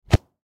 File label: Football Drop On Ground; Sports